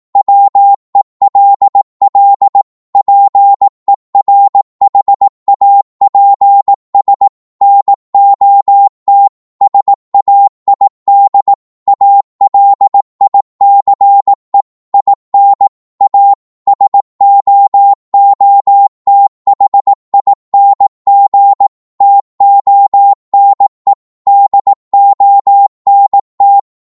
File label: 18wpm, Morse